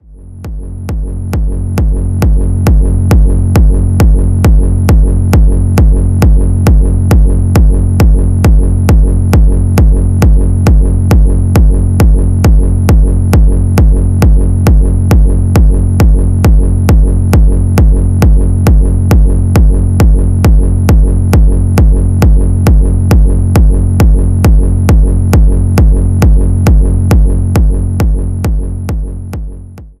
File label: signature kicks; zumstein